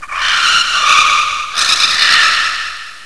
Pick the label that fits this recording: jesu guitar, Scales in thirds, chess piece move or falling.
falling